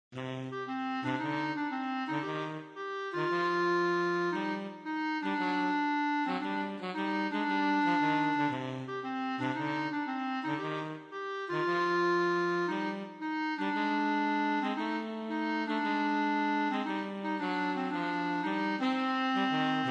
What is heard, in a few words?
Sax; Musik für Holzbläser